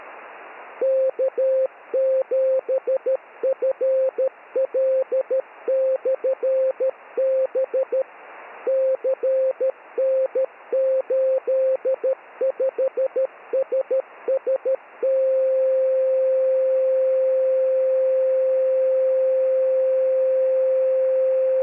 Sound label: 222 Beacon